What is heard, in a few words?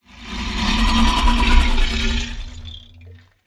Paracer sound